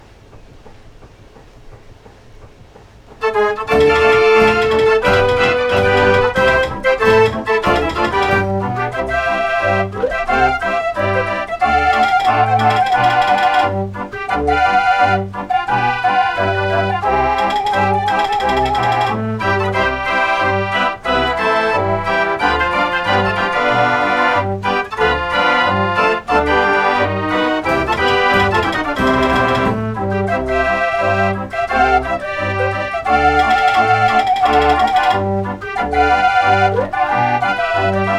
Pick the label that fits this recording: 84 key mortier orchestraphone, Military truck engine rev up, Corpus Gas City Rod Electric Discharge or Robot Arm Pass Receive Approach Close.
84 key mortier orchestraphone